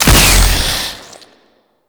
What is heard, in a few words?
Modular Secondary Barrel Fire, Weapons; SU Modular Guns; Barrels